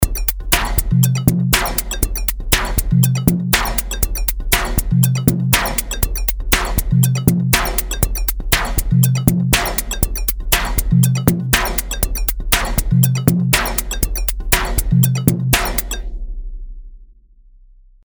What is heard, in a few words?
Drum Computer Industrial 90s